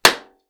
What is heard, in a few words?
Balloon Pop